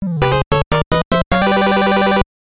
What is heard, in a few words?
Unknown Fanfare; Chip 'n Dale Rescue Rangers 2; NES